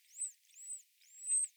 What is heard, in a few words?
dolphin